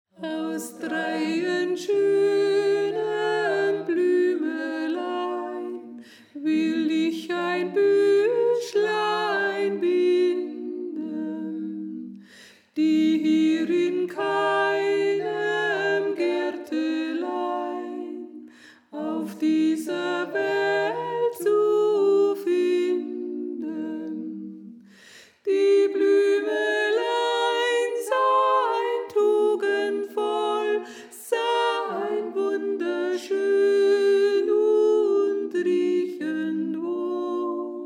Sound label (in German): Sopran; Aus Dreien Schönen Blümelein